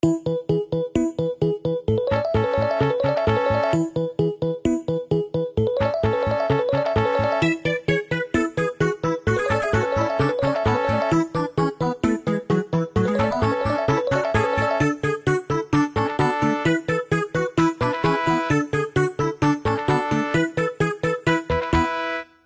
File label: BGM Event; Pocket Academy 3